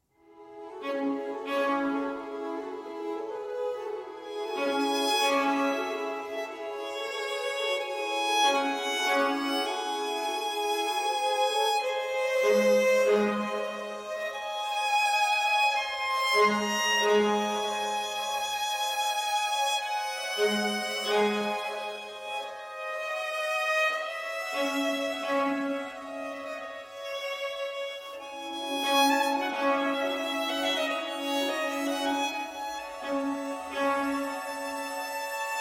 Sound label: Violin Concerto in E Major, Largo; RV 269, La Primavera